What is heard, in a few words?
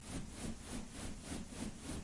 swing axe; frozen rush; kristoff